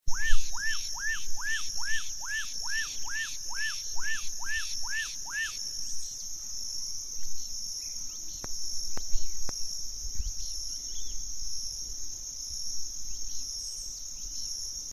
Donacobius atricapilla Japacanim, Serra do Deitado; Macaé.RJ; Entorno